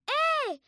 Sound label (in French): Chauve Souris He; mirumiru